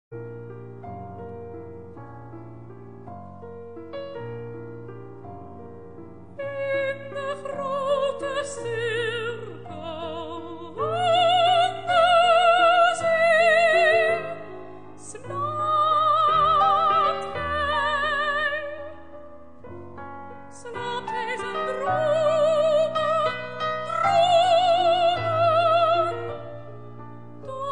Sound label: chamber music